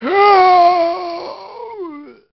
zombie idle, male

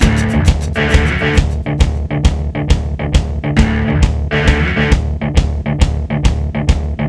song

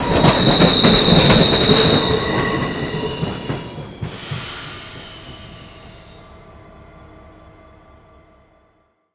train stop

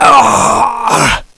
death

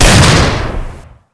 44 gun fire

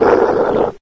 pit drone die; scmod; alienassassin

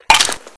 ammo shotgun

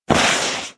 feet slide; Physics

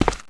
footstep concrete

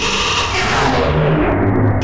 portal loop

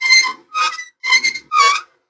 valve turn; buttons

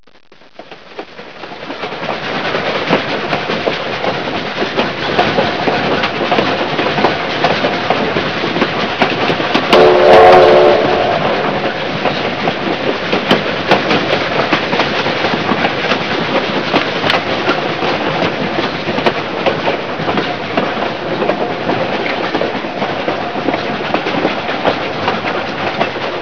in train

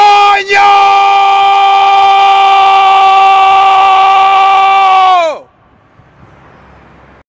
die spin

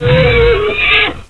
die

